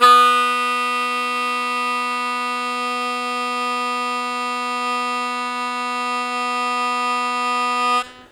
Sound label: Loud Attack Sustain B0, Harmonica; Zero G - Harmonica